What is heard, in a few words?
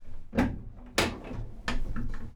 peugeot p4 latch; MKH8040; Pole Position